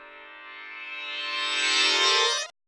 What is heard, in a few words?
perc reverse, Roland MC-909